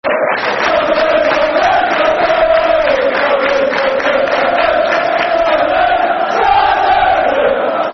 Southerners [Football Chant (Soccer Song)], Southampton